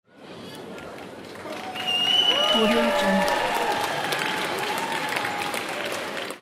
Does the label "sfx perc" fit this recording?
No